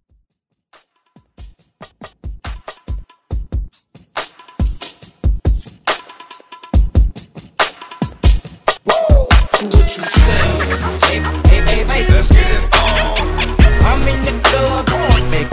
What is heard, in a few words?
Throwback Hype Quick